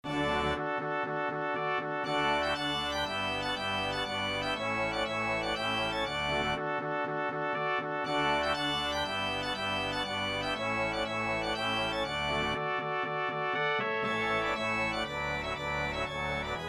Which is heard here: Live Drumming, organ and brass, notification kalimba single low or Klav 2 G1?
organ and brass